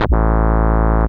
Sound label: dance, bass; Techno Trance Essentials